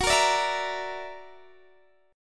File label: Gb Augmented 7th Gb down Guitar Standard; Gb+7 strummed